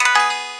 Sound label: gayageum, keytone